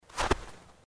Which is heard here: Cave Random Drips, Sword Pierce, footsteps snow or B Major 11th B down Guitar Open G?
footsteps snow